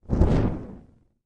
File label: Fire Ball Catapulted